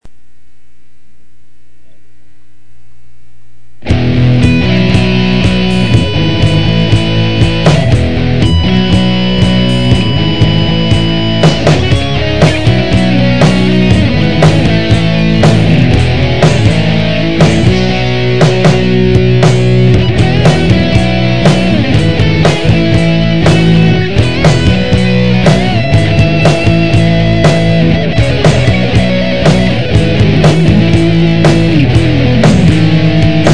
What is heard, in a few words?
hard rock